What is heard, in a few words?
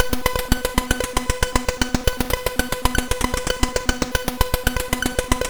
Synth Sample III, MOS6581R2